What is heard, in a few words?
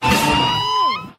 sproing, stabs